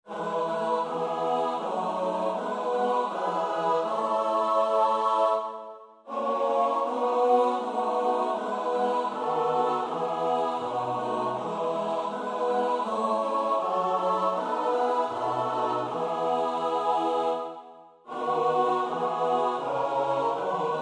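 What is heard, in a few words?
soprano alto tenor bass; Bach (Johann Sebastiaan); BWV 045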